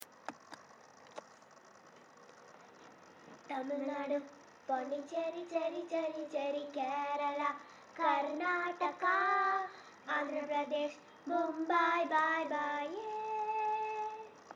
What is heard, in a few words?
office anthem; KPN